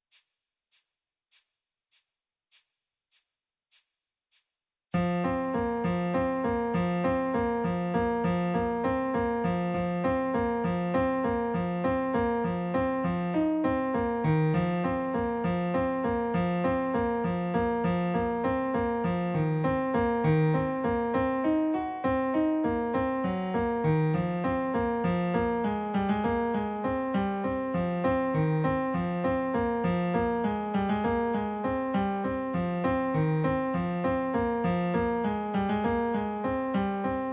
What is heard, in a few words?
Arpe